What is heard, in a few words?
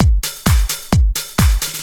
straight, Techno Trance Essentials drum loops